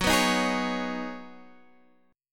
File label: B 6th Add 9th F sharp, guitar chord